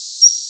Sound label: song